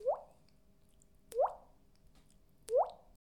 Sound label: Bubble Poping Sound With Mouth